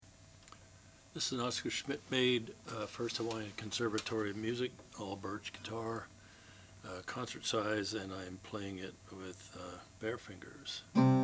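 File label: oscar schmidt first hawaiian, Guitars; 1928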